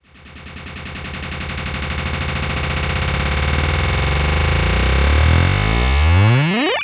FX Crank Uplift; Riddim; One-Shots; SFX; Ghosthack; Riddim Essentials Volume 2

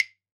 Claves Rosewood, World Percussion; Cymatics; Emperor World Percussion

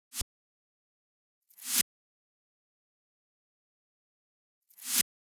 Dubstep Drum Loop Pre Snares, 150bpm; Full Drum Loops (Stem Seperated); Ghosthack; Dubstep Sound Arsenal

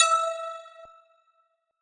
Riddim Synth Hit E Dusk; One Shots; Ghosthack; Riddim Essentials 2020

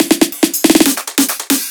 Dubstep Fill, 150bpm; Drum Fills; Ghosthack; Dubstep Sound Arsenal

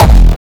kick oneshot lilmonster D; GhostHack

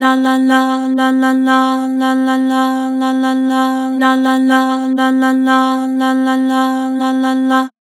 "Lah", Vocals; Harmor

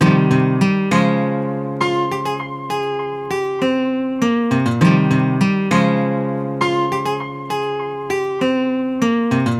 West Coast Melody Loop - 100 BPM F Min; Cymatics; Oracle Sample Pack

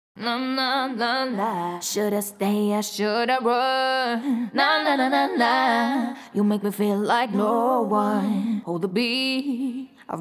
WET ACAPELLA (AbMaj), Pop Vocals; Ghosthack; Ultimate Pop Vocals; Heart Break

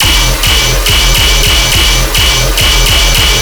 Bass Loop E 140BPM, Dubstep

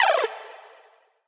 Collectibles Sci Fi FX; Cymatics; Video Game Sample Pack Vol 1

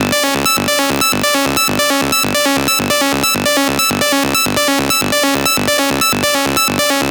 Synth Loop G 135, Techno; Ghosthack; AC2024 - Day 1 - Techno Sounds 2025

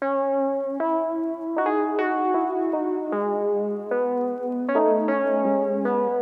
Happy Melody Loop - 154 BPM E Maj Chords; Melodies; Loop Stems; Cymatics; Oracle Sample Pack

